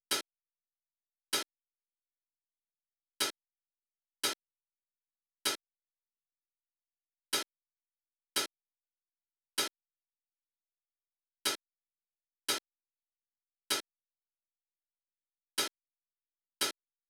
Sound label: hat